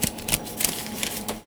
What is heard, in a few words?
Foley